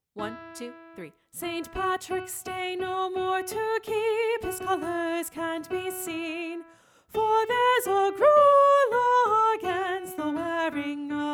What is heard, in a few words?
Wearing of the Green - Soprano 1b